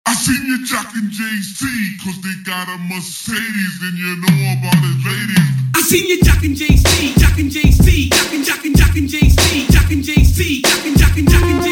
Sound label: Instrumental